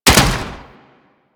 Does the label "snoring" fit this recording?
No